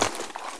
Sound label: displacer spin